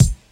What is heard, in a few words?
treble rich kickdrum sound c key